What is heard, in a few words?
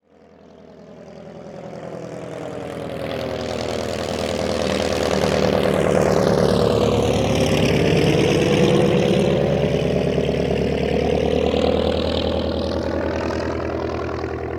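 Ambient Vehicles; Red Orchestra Ostfront 41-45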